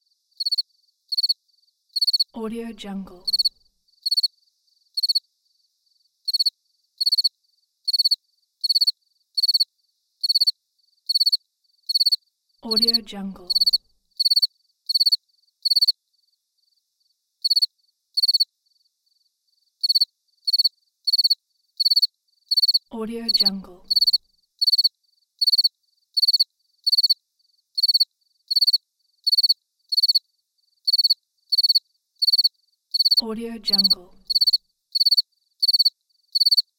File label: cricket